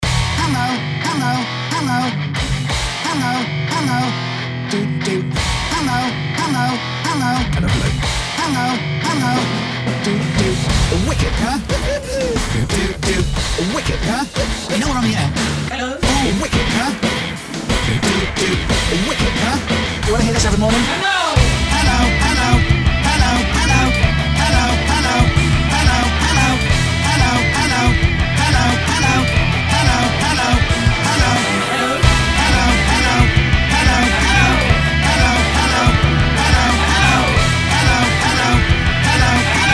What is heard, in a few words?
Thrash metal mix